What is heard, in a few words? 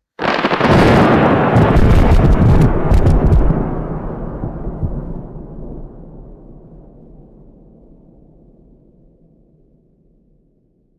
distant thunder crack and rolling rumble